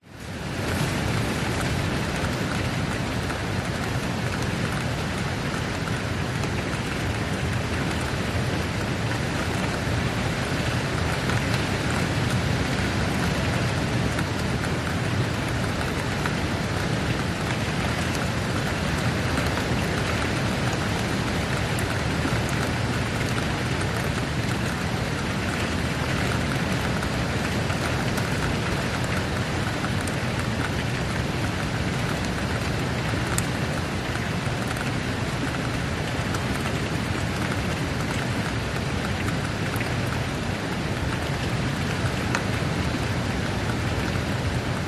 nature rain wind medium drips window close internal